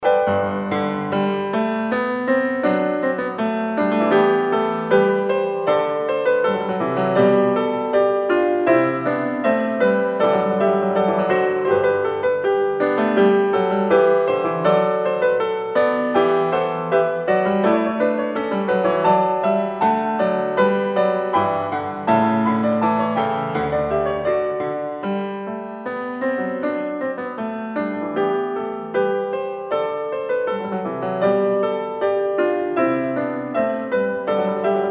Bach Goldberg Variations; Klassika; Bach, Johann Sebastian

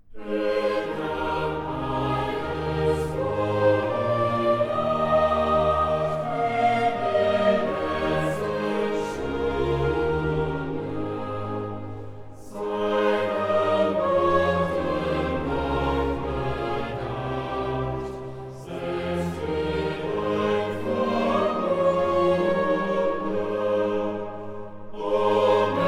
Chorale; Er Nahm Alles Wohl In Acht; Klassika; Bach, Johann Sebastian; Choral Works; Johannes Passion BWV 245

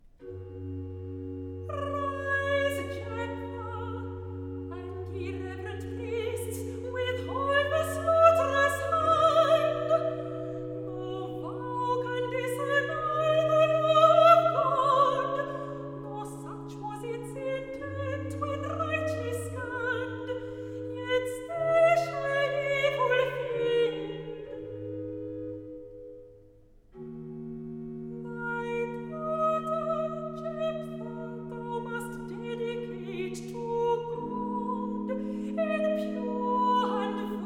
Recitative; (Angel) Rise, Jephtha; Handel Masterworks; Klassika

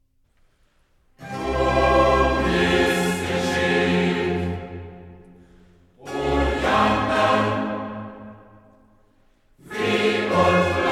Choir; O Missgeschick; Handel Masterworks; Klassika

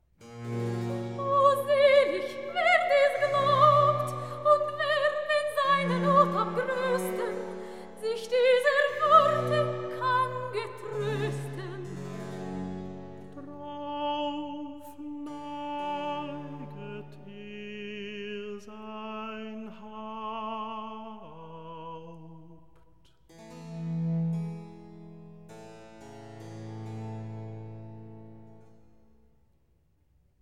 Recitativo; Gläubige Seele, evangelist O selg wer dies glaubt; Klassika; Handel Masterworks

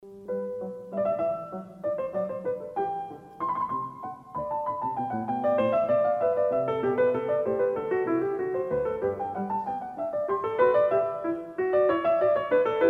Bach Goldberg Variations

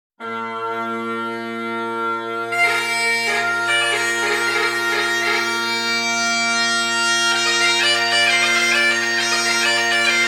glasgow city police pipers

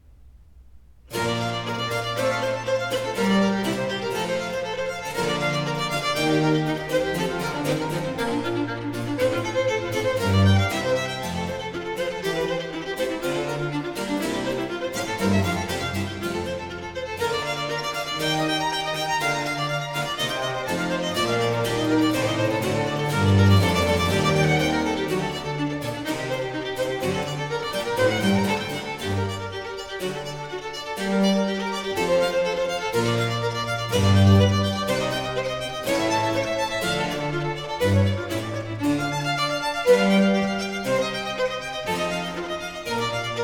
Sonate 3 in C major 4. Allegro; Arcangelo Corelli; Corelli - Complete Works; Klassika

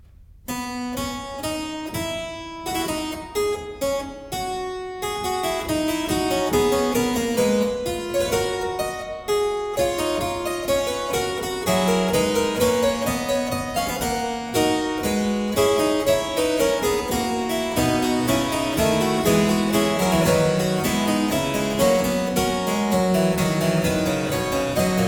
Fuga, Keyboard Works, The Well-Tempered Clavier; BWV 846 C II; Klassika; Bach, Johann Sebastian

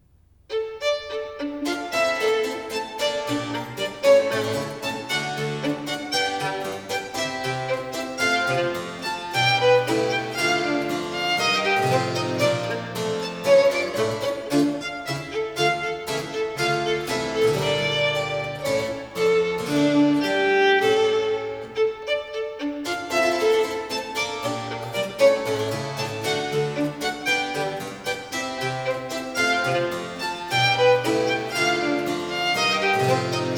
Sonate 8 in E minor 2. Allemanda, allegro; Arcangelo Corelli; Corelli - Complete Works; Klassika

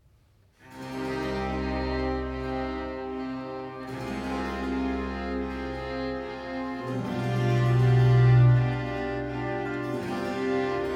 Concerto 12 in F major 3. Adagio; Arcangelo Corelli; Corelli - Complete Works; Klassika

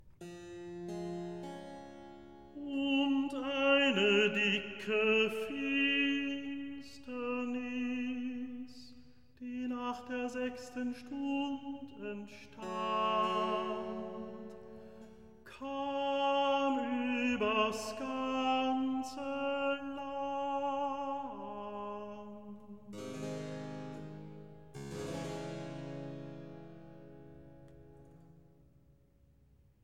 Recitativo; evangelist Und eine dicke Finsternis; Klassika; Handel Masterworks

